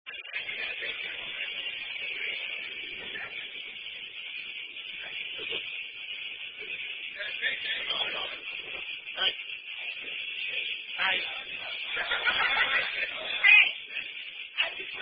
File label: Drinking Game; Drinking Saturday Night Three Man Game